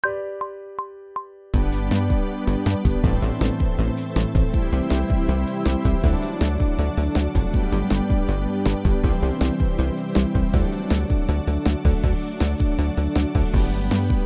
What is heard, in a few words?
Technical Work Riffs G major (backing track), pop vocals; Grade 1